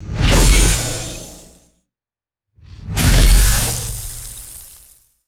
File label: metallic sci fi impact with sizzling decay; magic-scifi